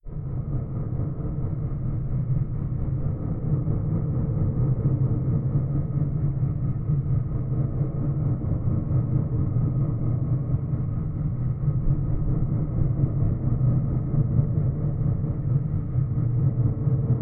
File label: Echo Propeller